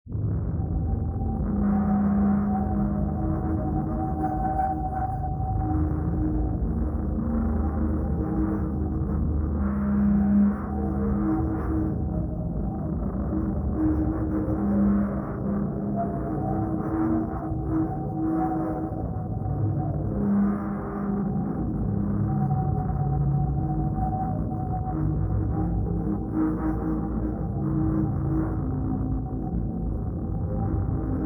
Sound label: Water Organ; SFX; Ambient - General